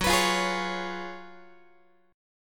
F sharp Major 7th Suspended 2nd Suspended 4th, Guitar; F#M7sus2sus4 strummed